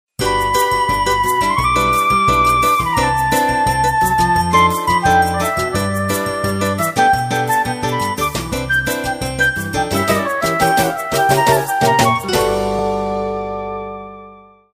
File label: Bossa nova; Qiku; Luna Youth